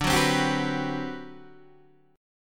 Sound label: D 13th D, down, Guitar, DADGAD